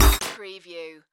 Hi-tech Panel Servo Button

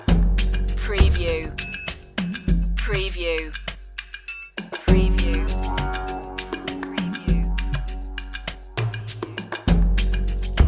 indian morning loop, chill

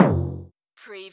CARTOON TYMP BEND DOWN

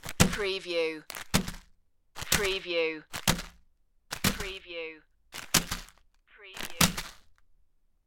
throw down package

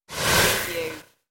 robotic interface message

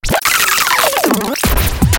Turntable Scratching Reverse

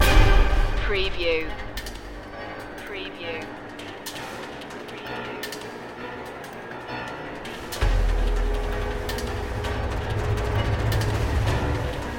virtue of vengeance no choir

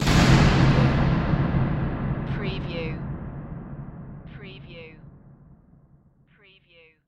Hit Drum

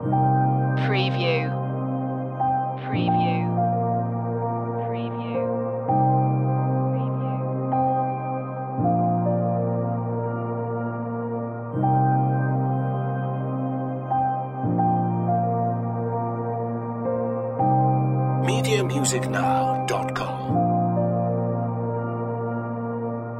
loop

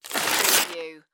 Package Label Peel; sound-effects-bible

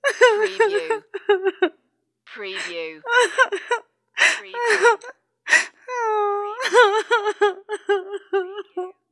Female Crying

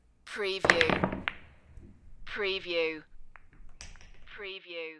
Pool Break; Sports & Games / Billiards, Pool & Snooker

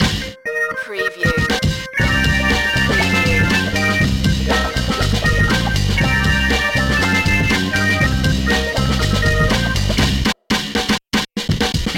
beatnik loop

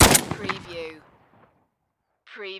Shotgun Benelli M4; sound effects bible

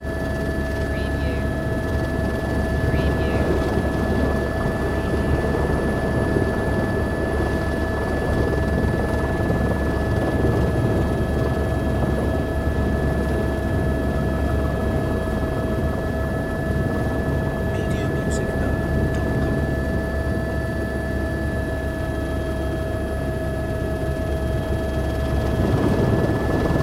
Helicopter Flying Turns

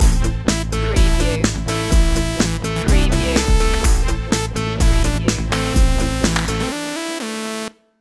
retro video game krypton stinger

